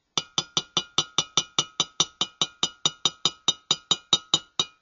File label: Ceramic cup with homogenized cocoa